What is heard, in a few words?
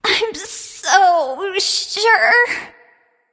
death; smite; aphrodite